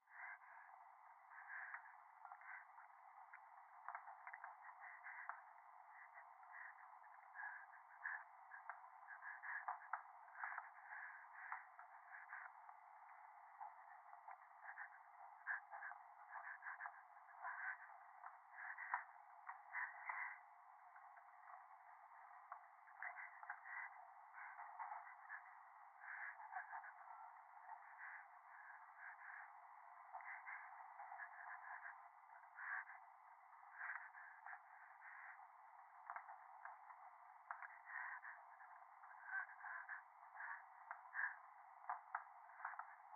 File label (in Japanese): 電話 右耳快感吐息 電車